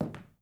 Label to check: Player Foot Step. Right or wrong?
Right